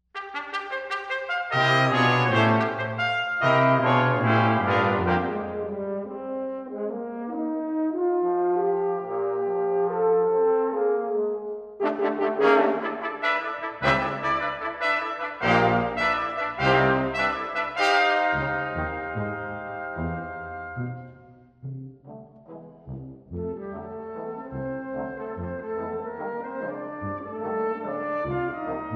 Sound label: Concertino for Brass Choir, Fast